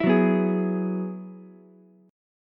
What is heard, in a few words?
Gb 9th Suspended 4th, Ukulele C6; strummed; 9,6,7,11; JGuitar